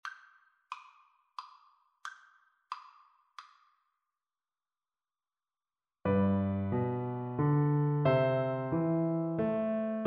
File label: Double Bass